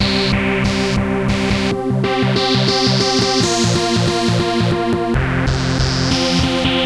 techno synth, bass loopes; lmms

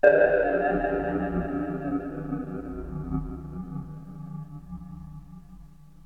nord ambient, strings n pads; lmms

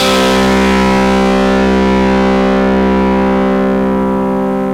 steel guitar heavy distorted; instruments; lmms

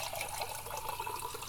glass, dishes; tuxpaint-stamps; household

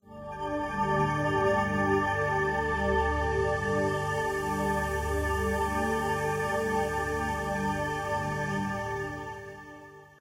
bell choir, strings n pads; lmms

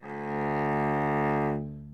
cello; lmms

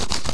grass, magic sounds; tuxpaint

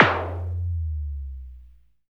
snare electro; lmms; drums

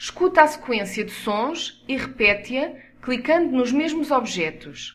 melody; gcompris

